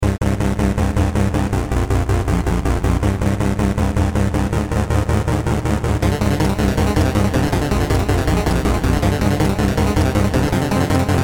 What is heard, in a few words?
alt boss, Platformer Chiptunes